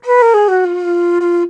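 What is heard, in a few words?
bansuri; E-MU Producer Series Vol. 7 – Old World Instruments; World Combos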